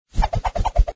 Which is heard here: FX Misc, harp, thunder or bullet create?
bullet create